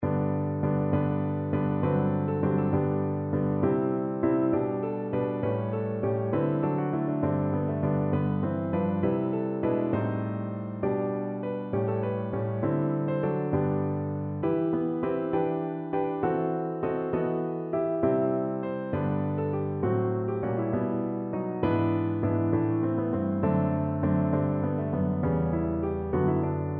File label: Lullaby PNO, piano